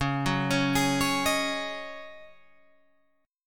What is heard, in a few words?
C sharp Suspended 2nd Suspended 4th, C sharp, Guitar, Open G